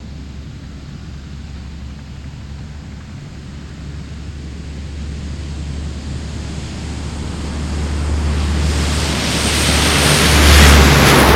C130 Take Off, Hercules

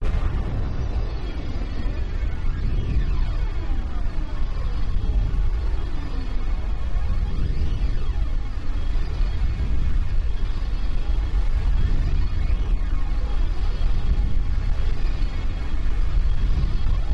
ARRIVAL, spaceship fx

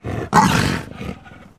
Leopard Snarl Raspy; big cats

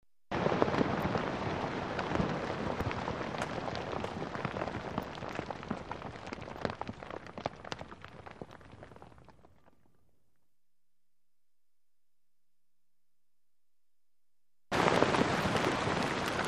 Dirt Debris Falling, rock & sand

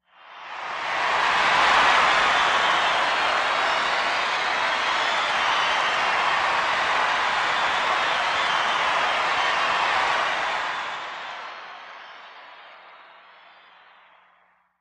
Concert Crowd Huge Cr, concert

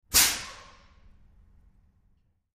Air Brake Hiss Squeak

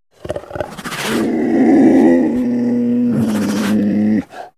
Leopard Growl Hollow; big cats

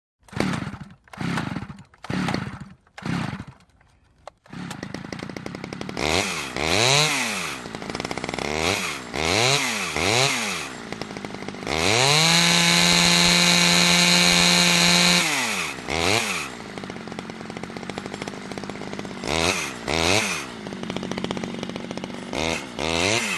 Chain Saw; False Starts Then Starts; False Starts Then Starts With Short Revs And Runs, Then Off. Close Perspective.

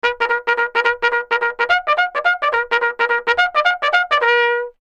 Bugle Charge Single B, bugle civil war

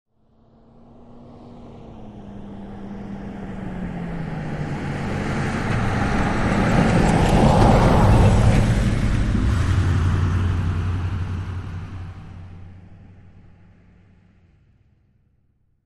Wagoneer: Medium By With Decel, Jeep Wagoneer

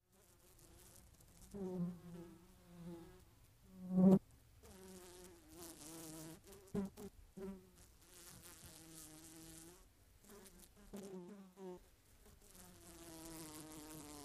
Multi Flies Buzzin

